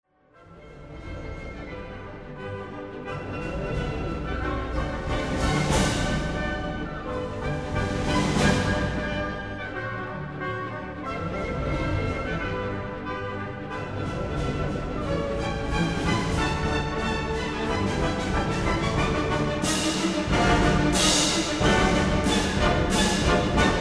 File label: polka, string quartet